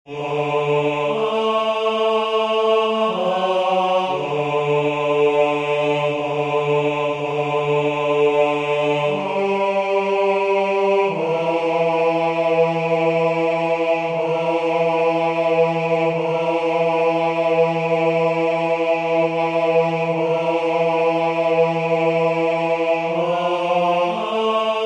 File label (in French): 4 verset 7 Ten; Monteverdi; Vespro; Vespro della Beata Vergine (1610); Baroque